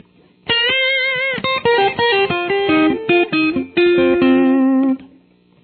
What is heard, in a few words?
solo